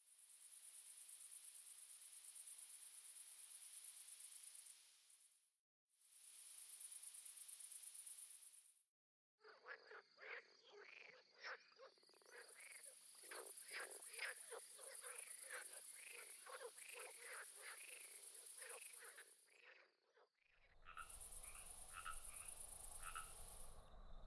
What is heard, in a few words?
village frogs crickets; busaevo